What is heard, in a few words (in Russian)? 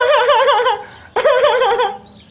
smeh